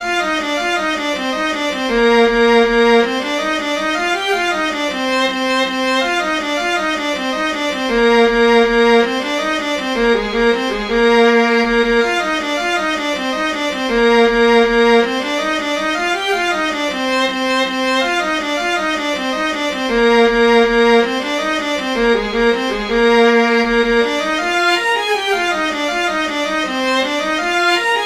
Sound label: Persian Dance